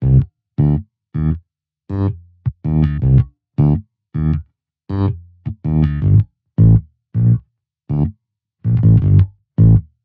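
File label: bass live 80 D minor; 808s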